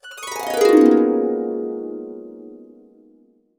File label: GB MAJ, Harps; USB Soundscan vol.62; AKAI